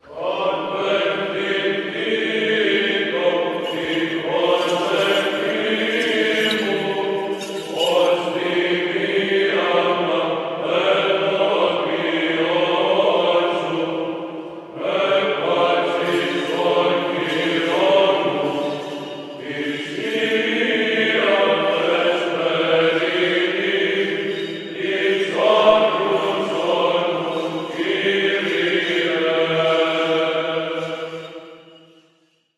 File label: Choir; Kateuthin; ChoirDimIrakleiou